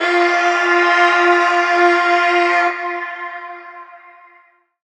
vocal callout Fm; Slate; AURORA Sample Pack; Vocals

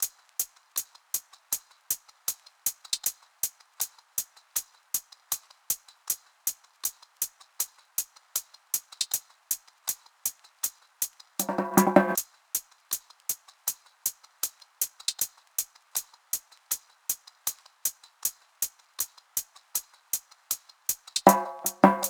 hats 79, Hat Loops; drum sample pack; Fuji; SEISMIC; Slate

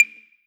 Perc Clav Float, 909 Percs, Vintage Drum Machines; Slate

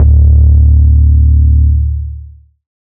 808 Bass Growl D3; Slate 808 Deep Dive; SD Signature 808s

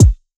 kick crayons, Hip-Hop; Drum One Shots; SD CAICOS Hip-Hop Sample Pack; Slate

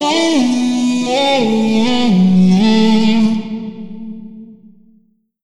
Vocal Sample Pack Phrases A; Slate Digital Omnivox Vocal Sample Pack

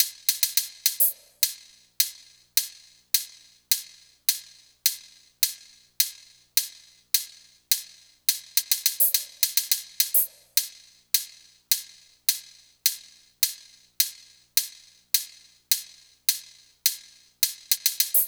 hat 105, Hat Loops; Drum Loops; SD MIDNIGHT Sample Pack; Slate

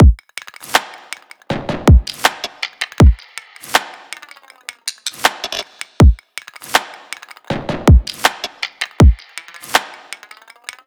drum loop 80, Pop; Drums; Full Drum Loops; armada; Slate; SD PASADENA Pop Sample Pack

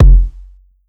Kick, 909 Kicks

